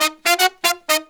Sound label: Pop & Funk Brass, 120; Best Service ProSamples vol.25; AKAI